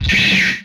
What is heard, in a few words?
Sci Fi Gun, Blast; Space Blasters2D